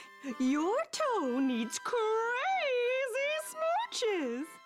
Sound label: crazy smooches